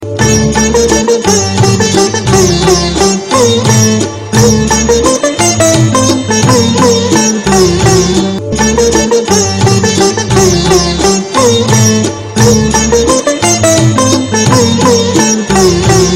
timba

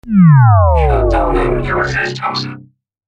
shutdowning systems

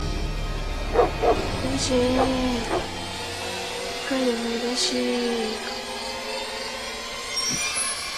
hmong nkauj nyab

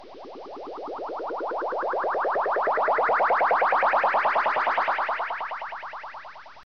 UFO Liftoff

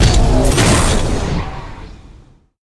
Rocket League Jumps

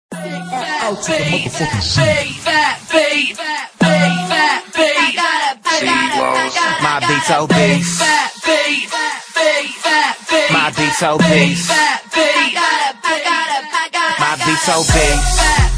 big fat beat, ringtones

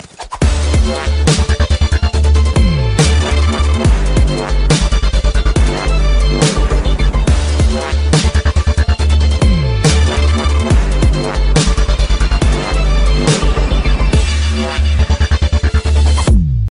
Dubstep

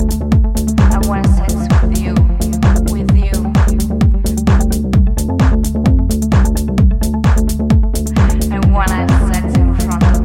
cool techno

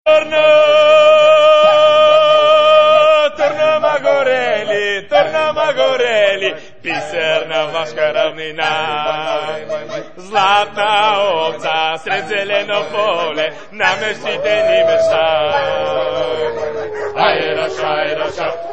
Bustle

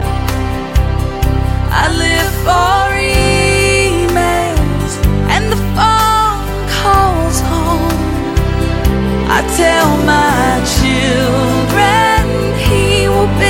arabic tone

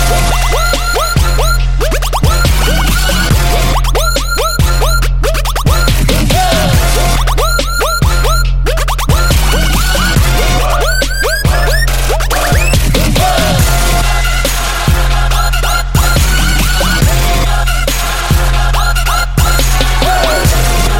Trap

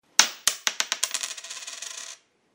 Coin Drop

